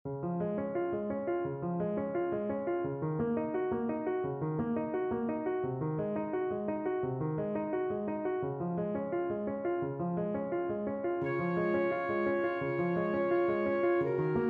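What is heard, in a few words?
gounod ave maria alto saxophone, piano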